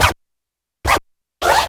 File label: scratch short; best service Blue Box; mixed sounds short